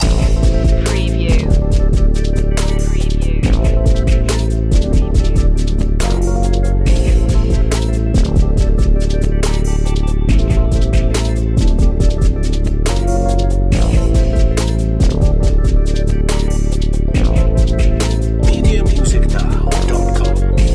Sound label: urban groove loop